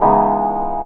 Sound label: CHORD R, Drum & Bass 160 BPM; Ueberschall; Drum & Bass Flash Trackz